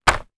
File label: twister game sheet foot down